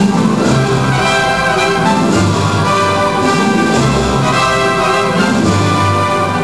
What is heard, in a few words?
win music; Hearts Deluxe; Chance⁄Cards